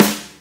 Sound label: snare, drums; virtual drum sounds; custom drums